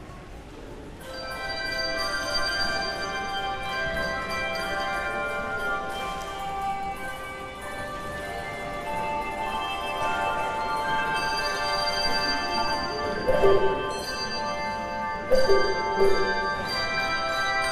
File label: Handbells